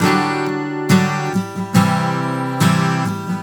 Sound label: GTR, Celtic Flavours; USB Soundscan vol.42; AKAI